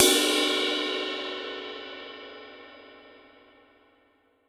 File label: Ride Hit Long; Trivialaccapella